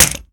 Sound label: weapon hegrenade impact; physics; weapons